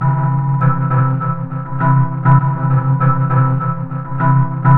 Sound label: Foamy Riff, Electronica Sequences